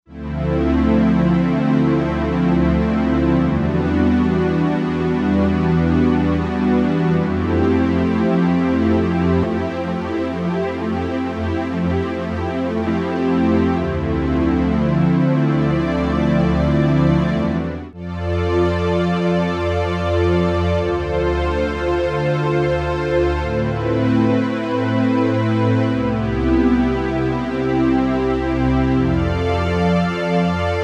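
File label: pad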